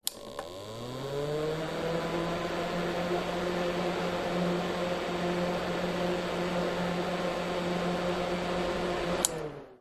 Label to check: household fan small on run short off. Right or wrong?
Right